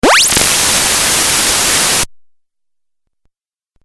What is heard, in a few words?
Laser Hiss Video Game